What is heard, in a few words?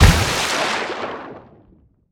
torpedo; World Of Warships; weapons